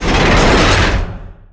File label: window gear small, machines; Quake 4 Alpha